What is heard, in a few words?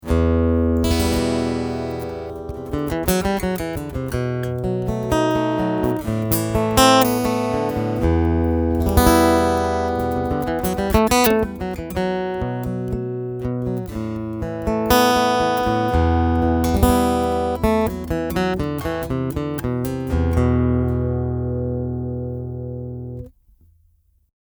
EQ Full